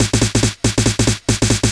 Fill 140 BPM

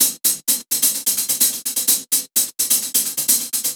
Real Hihats, 128BPM; Loops; Vengeance Samples

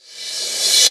Reverse Crash, Cymbals; Vengeance Samples

